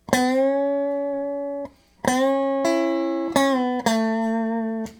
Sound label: guitar, distortion box; Circuits